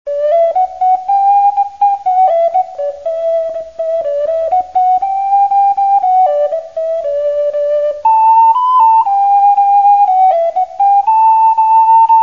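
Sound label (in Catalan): ocarina, instruments de vent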